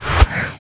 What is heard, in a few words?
attack